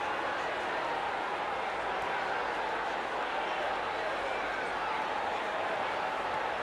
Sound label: ambience level1; superstriker